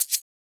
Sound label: Shaker; FLPRO